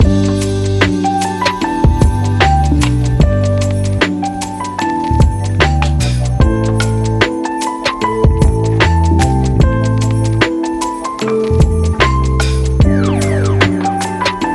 chill christmas vibes

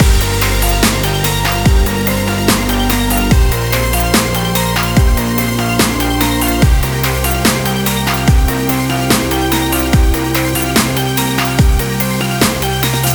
hi tech dubstep

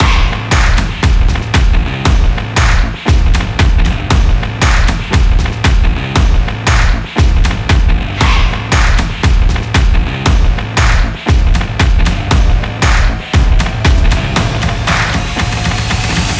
stomping extreme sport loop

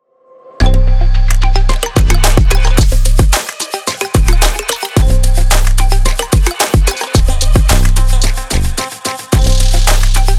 dynamic impulse

